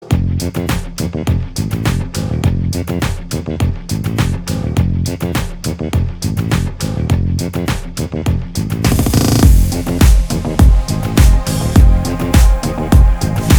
bgm ringtone

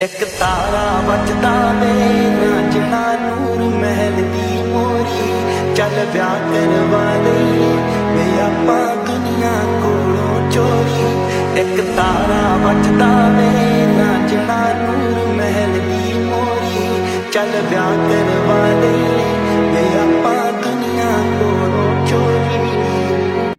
ringtone; noormahal

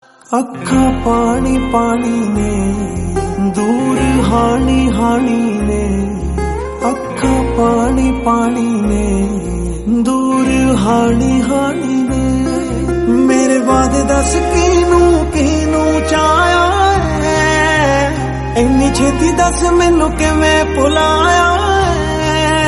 Punjabi

